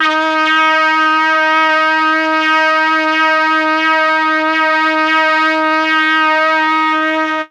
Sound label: MEL BRASS; ILIO; Propeller Island; Legendary M400